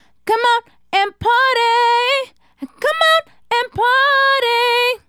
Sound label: Female Solo, Hip-Hop and RnB Vocals